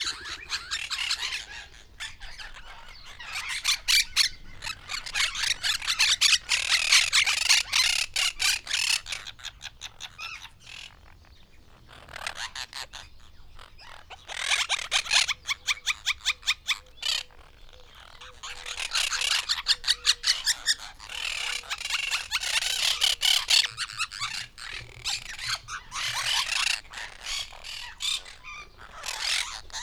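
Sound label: monk parakeet, nice-sounding together; debreceni zoo 2012; barat papagaj; marantz puska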